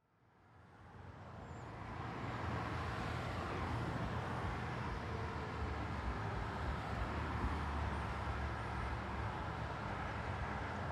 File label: Ambience Busy Road 180D; AdobeCC